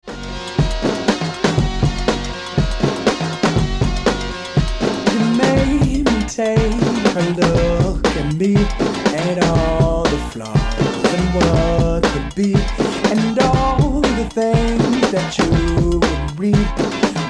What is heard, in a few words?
nerd laugh